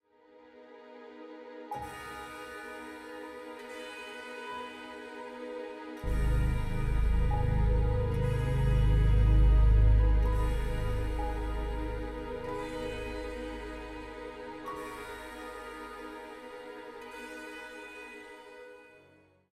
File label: String; assassin s creed unity gamerip 2014